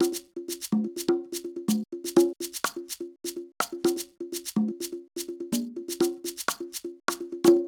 top perc, 125 Beats

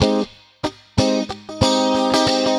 Electric Guitar

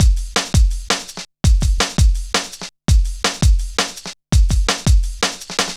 Drum Loops, Jungle, 166.5; Zero-G Jungle Warfare 1, 2 & 3; Breeze; 90 s Sample CDs

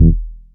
Syn Bass; Equipped Music

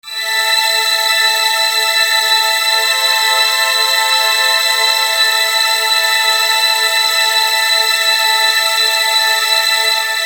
Synth String; Construction Kit; HIP-HOP; Sonic Foundry (Sony Creative Software)

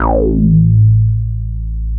A2, Bass; E-MU Producer Series Vol. 6 – Analogia Project (CD 1); Boom da Bass 2

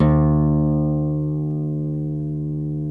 NYL NV E, nylon no vib guitars; Spectrasonics; Hans Zimmer Guitars VOL-1

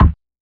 Rhythm Kick Side Stick, Realistic Concertmate-670; Rhythm Layered Kit

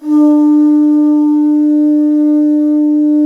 conch shell; Spectrasonics; Heart of Africa 1

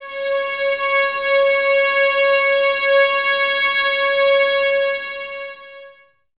violins C#5, string ensembles; Miroslav Vitous; 11 Violins

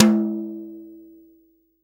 TOM, Real Drum Kit, SWING KIT; Best Service ProSamples vol.43 - Real Drum Kits

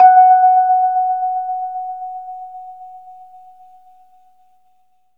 Rhodes fast F#; 3D Sound; 3D Keys VOL-1

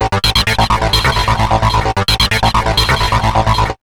HRD 130 SYN, Big Beat; USB Soundscan vol.25; AKAI; HARD 130

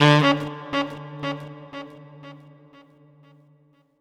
Sax Echo

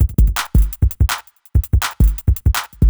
707, Breaks; neuro; Sinistarr

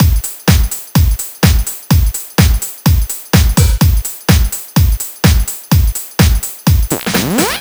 Drums, Drum Loops 126bpm, Nu Dance Electro; Zero-G; Soundclash Nu Dance Electro; Acidized Wavs; Berlin Glitz

